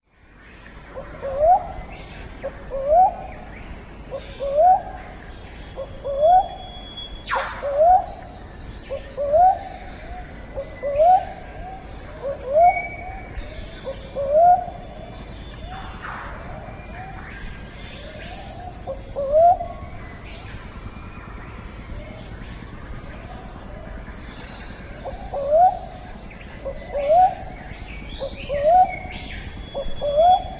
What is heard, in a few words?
brown cuckoo dove